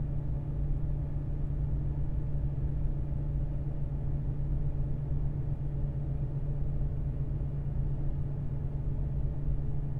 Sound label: mazda rx 8 231hp renesis int cabin 4k rpm loop stereo; sonniss gdc